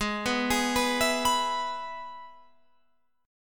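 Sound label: Ab Minor Sharp 5th chord, Guitar, Open F tuning; Abm#5 arpeggiated; JGuitar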